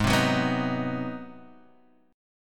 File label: G sharp 7th Sharp 9th, Guitar, Standard; strummed; chord; JGuitar